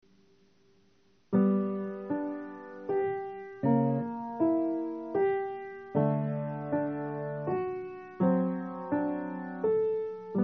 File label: bass